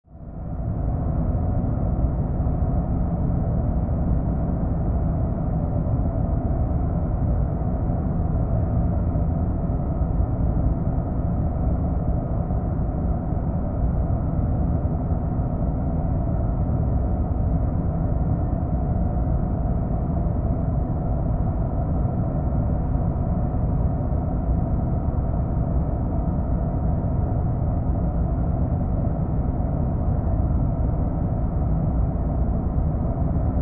Ambience Cargo Bay, Scifi, Ambience, Cargo, Bay, Science, Fiction